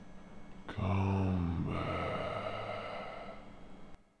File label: zom ambient; zombiepanic